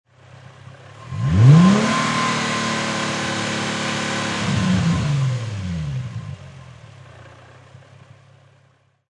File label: Infinity G35 Engine Long Rev, High Performance Car; Auto, Power Car; Engine perspective. Revving